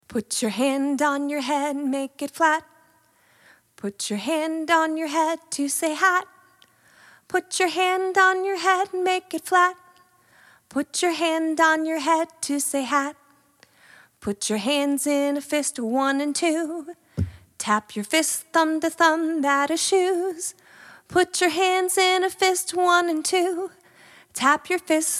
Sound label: hat shoes coat signing song